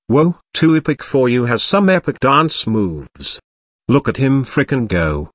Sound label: dance; Wrapper Online Plus